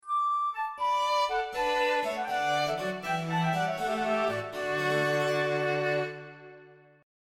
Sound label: Ringtone; purcell; Московская консерватория